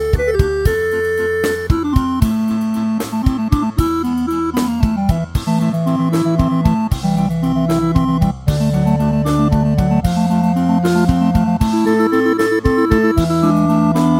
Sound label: electric pipe organ